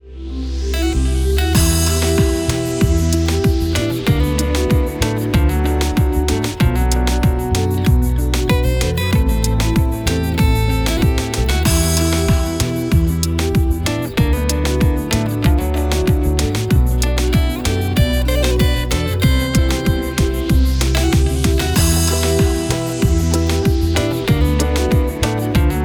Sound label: Reggaeton Fever, Reggaeton